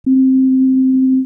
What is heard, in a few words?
trumpet